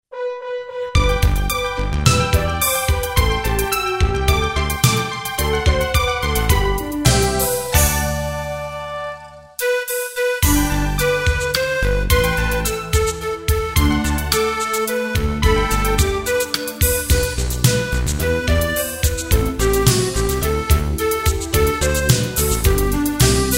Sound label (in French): il etait un petit navire instrumental